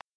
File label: Instrument